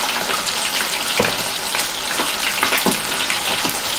frying chicken

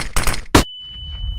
flashbang

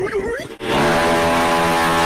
silly fart lol

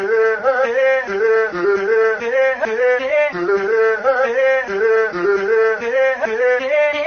hue hue hue; metal sonic plush

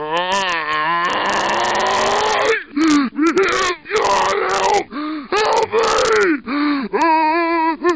zombie backwards, buzzer; half life

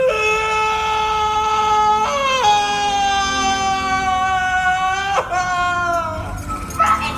rocket scream

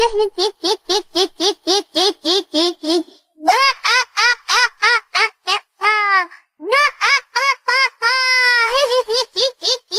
villain laugh; sparxie

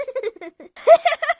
cute giggles

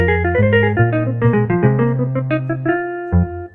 victory jingle; plants vs zombies

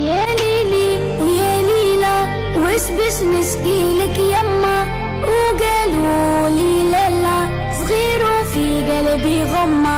bass boosted

